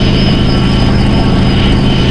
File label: BAD BIKE; CD Action; shadow